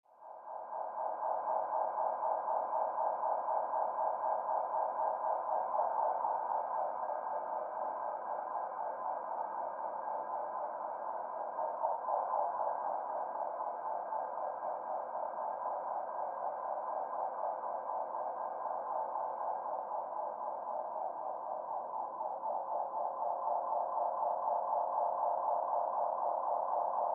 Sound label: Siren Fast Ambulanc; ambulance